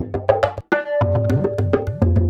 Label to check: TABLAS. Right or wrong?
Right